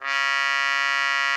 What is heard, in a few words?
CUP MUTE C#3; Xsample VOL-3